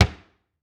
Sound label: Entertainment Center Door Close; household